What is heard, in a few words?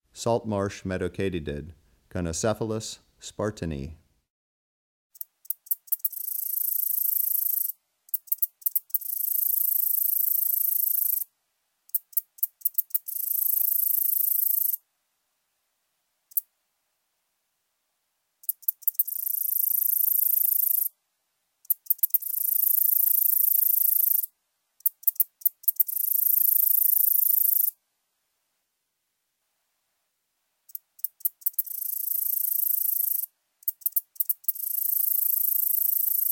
Saltmarsh Meadow Katydid, Conocephalus spartinae; Crickets and Katydids